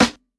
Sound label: tight low end snare one shot a sharp key, snare sound tuned to the A# note